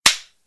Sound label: slap